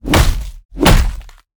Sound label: sword breaker